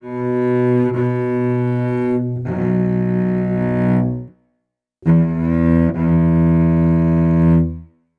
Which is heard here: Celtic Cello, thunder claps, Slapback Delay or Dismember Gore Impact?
Celtic Cello